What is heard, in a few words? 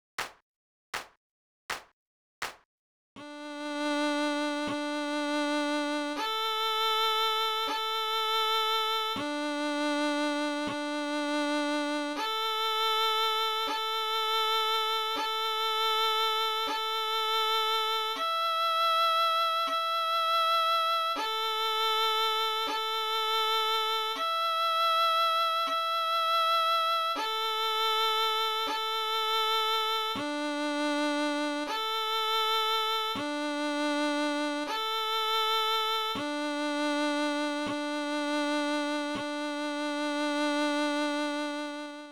First Song Violin